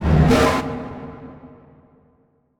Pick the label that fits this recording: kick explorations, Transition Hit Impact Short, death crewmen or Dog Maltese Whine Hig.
Transition Hit Impact Short